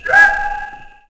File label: Cory Call, Dino